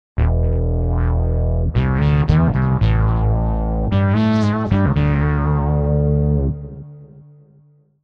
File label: Gnarly Bs; TURBO VL